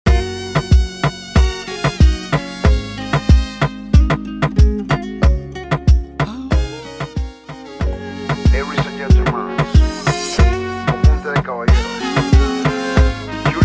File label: Remix Intro Clean Outro 93 Bpm